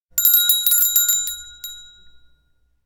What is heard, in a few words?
cafe door bell; anemoi